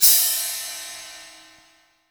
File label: COIN CYMBAL; CYMBALS